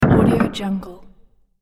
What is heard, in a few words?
castle game select drawbridge